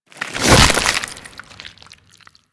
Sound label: Desert Skate Finisher Front B; Warframe; Attacks